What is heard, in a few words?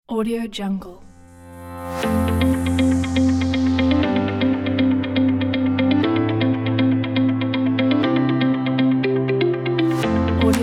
inspiring corporate music